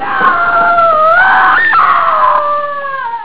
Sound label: mama cry; Amiga Soundtracker Sample Packs